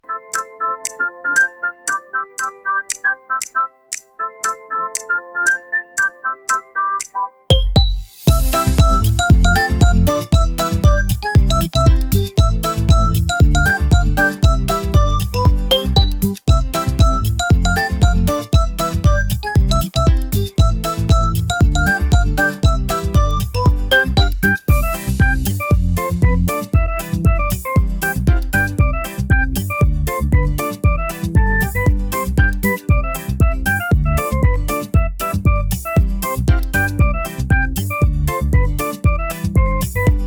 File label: dopamine ukulele